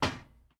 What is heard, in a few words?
Window Knock; Game rip; MiSide